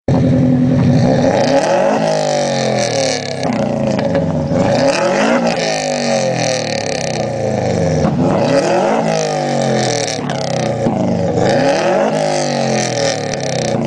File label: subaru sound; ringtones